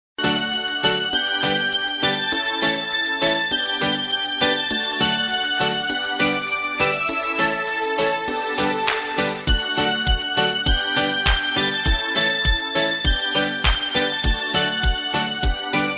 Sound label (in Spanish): GUATEMALA Música tradicional